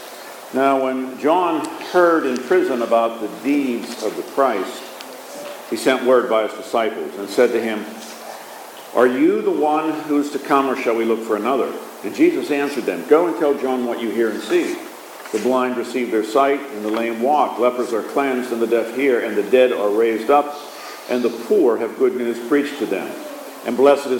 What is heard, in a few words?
Gospel